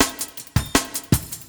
LOOP SD, 160 BPM

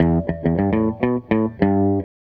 Gretsch Guitar Loop

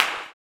CLAP, blues & soul; ILIO; Vocal Planet

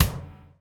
DOWN, DOWN KIT 78

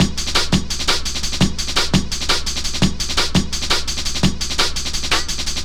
Sweet Beat, Drum & Bass; Fluid Dynamics

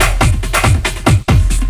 Drum & Bass, 140 BPM; LOOP; Ueberschall; Drum & Bass Flash Trackz

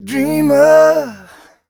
m 128 mel e-flat, Vocals

